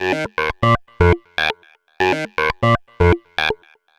Synth; Sonic Foundry (Sony Creative Software); Prototechno; Synths

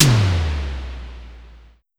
Drum X Tom, Pearl Drum-X

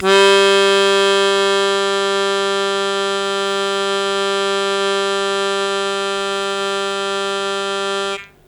Long Sustain Ta G0, Harmonica; Zero G - Harmonica

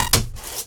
scifi, SCIFI & SWEEPS; Techno Trance Essentials

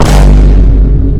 improved vine boom kick

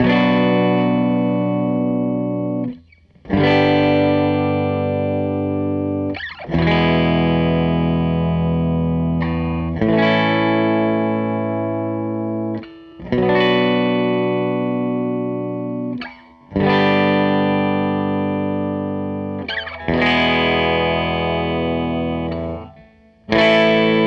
SG Guitar Loop

